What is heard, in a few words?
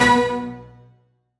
ok hit